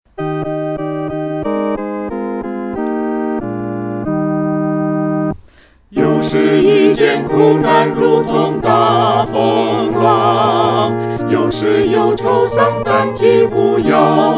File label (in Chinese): 合唱 四声部